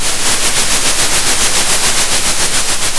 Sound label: pop, Sound of a train